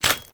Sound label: Footstep Default; Warframe; Hoplite